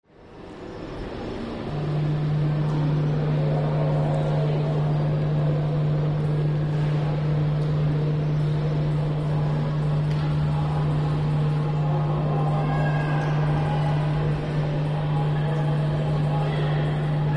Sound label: science museum